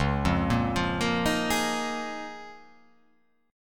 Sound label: Db 6th Add 9th Db down; Db6add9 arpeggiated; JGuitar